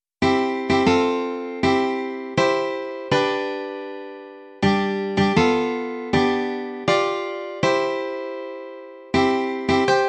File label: happy birthday variations